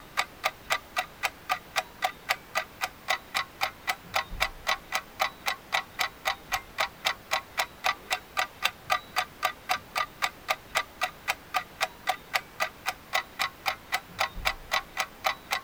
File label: Egg Timer Tick Loop